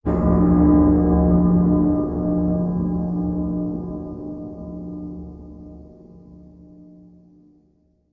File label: Sting Bells